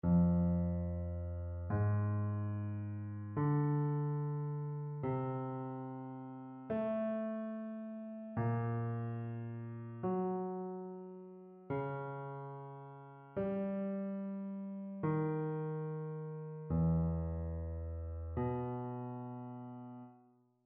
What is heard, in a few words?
row dictation; ear training